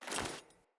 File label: Foley Run Default, Default Clothing